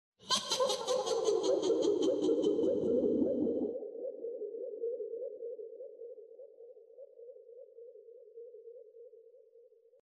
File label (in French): rire